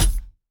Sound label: player mvt grab player